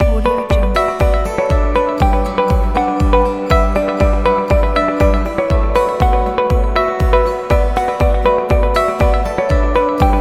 advertising corporate pack

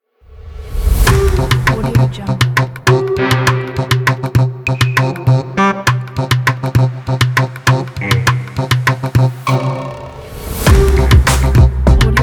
stylish sport music

